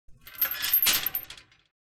铁门开门

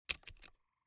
钥匙

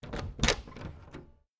书店内 关门

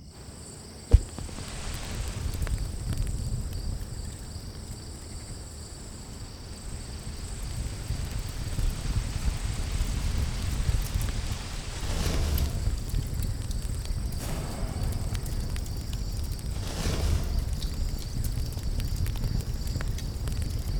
火灾演出

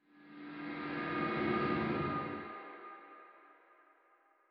书店内 生死簿名字消失; 序章

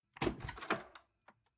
开门

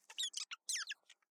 老鼠声

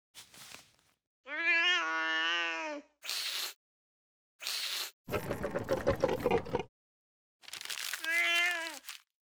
猫被抓住; 盒子猫音效铺设

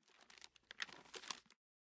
关闭纸张

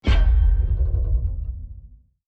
场景转换

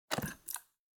退出界面, 八音盒

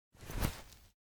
查看井水

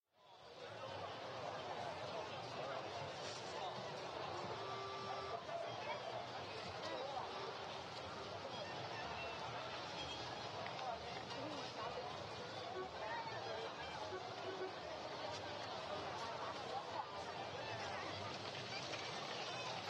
街道背景音, 环境音

